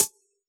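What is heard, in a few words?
Hi-Hat Closed, Yamaha DJX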